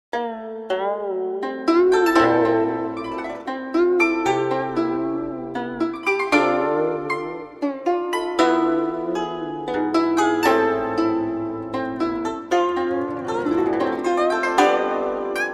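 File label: A Smooth Melody; Guigubahuang; Tale of Immortal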